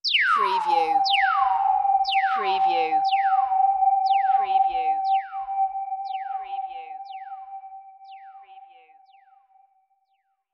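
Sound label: Delay Beep High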